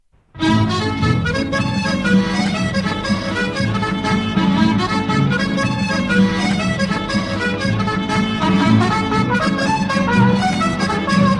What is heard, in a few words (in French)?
Canadian Barn Dance - Ecosse